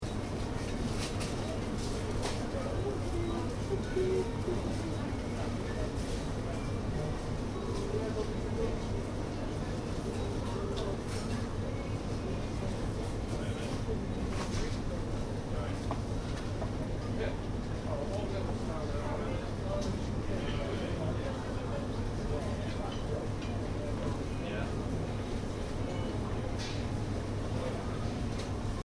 Interior supermarket, Supermarket, Interior: Ambience, Tills Beeping In Background; Stores And Mall; Backgrounds; Stores and Malls; Stuart Duffield